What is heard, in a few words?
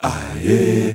Male Voice, African Voices